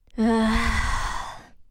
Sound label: hope noises